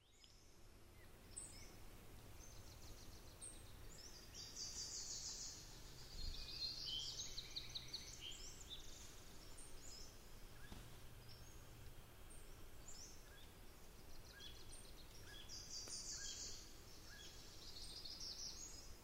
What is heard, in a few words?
bird